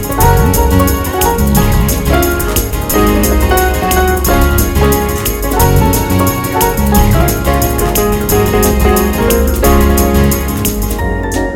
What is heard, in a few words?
Hot Jazz